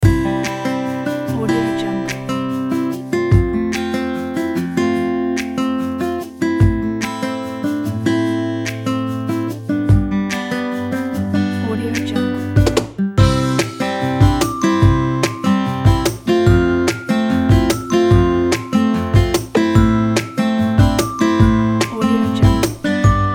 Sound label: subtle folk